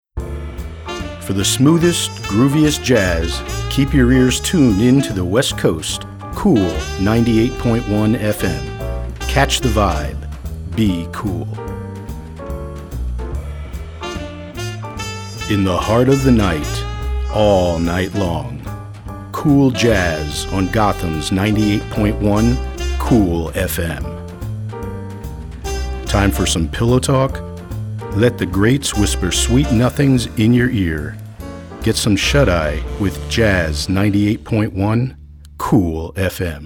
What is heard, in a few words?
Cool Jazz